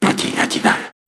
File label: jingle